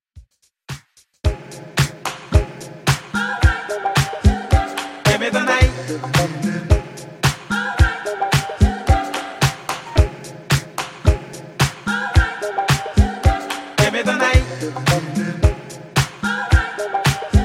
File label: 110 Bpm; Dj Intro Outro Clean